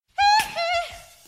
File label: hehehehhehehehhehehheheehehe